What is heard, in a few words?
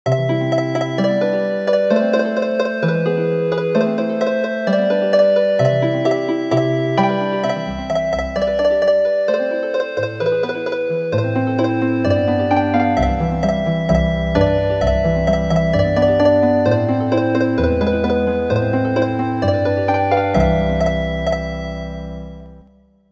Healing Harp